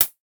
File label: HiHat; WoWInterface; Plater